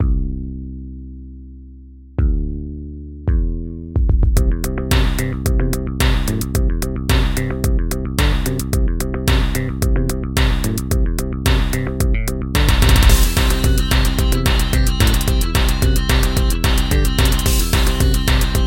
Instrumental; friday night funkin vs. umbal family computer

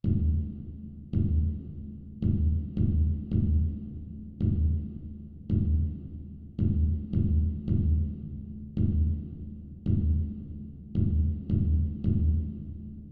Cave Music, ENERGY Rhythm Call

